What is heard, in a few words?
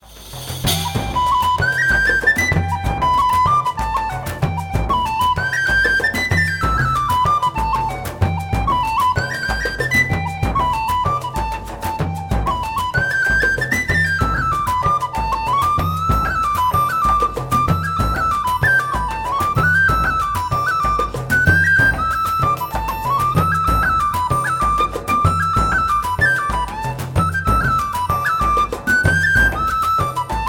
jig, slow session